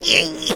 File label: push; Scrat